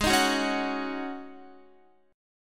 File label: Ab 7th Suspended 4th Ab down Guitar